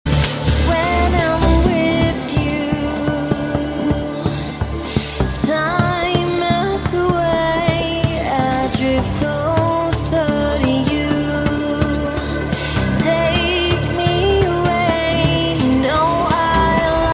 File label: edm ringtone